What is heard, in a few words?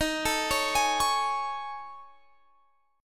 A Major 7th Flat 5th